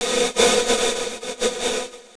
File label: signal correlated noise